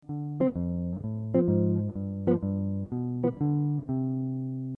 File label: bass